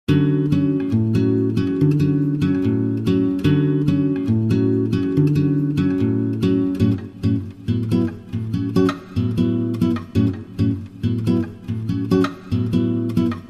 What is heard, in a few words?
Bossa Nova